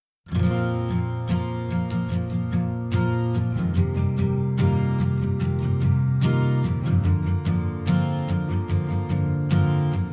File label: Rhode NT2A mic